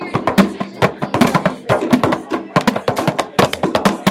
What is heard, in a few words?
Music Sample ostinato